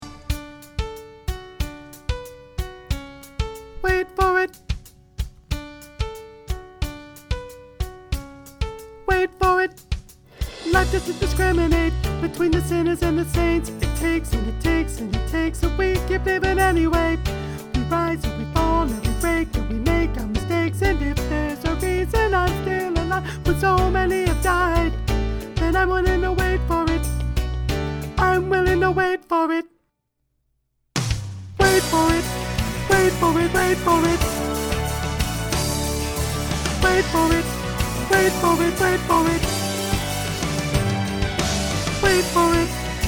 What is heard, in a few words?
soprano